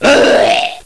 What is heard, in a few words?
oargh